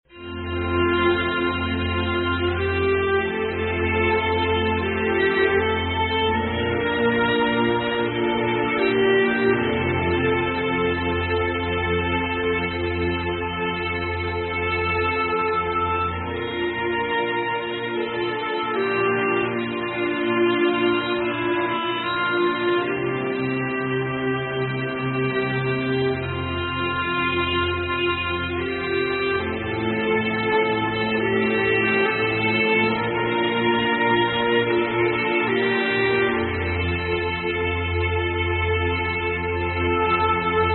When I Survey, Instrumental Hymns

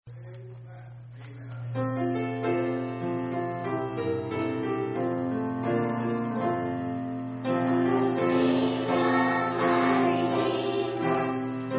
kids choir; Special Singing at EBC

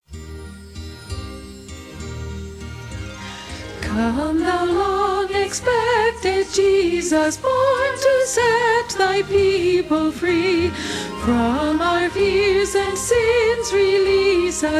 Alto

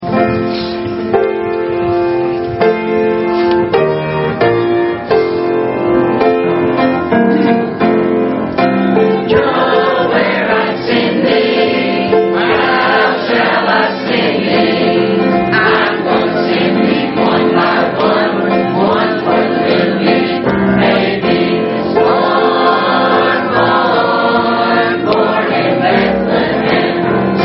Children Go Where I send Thee

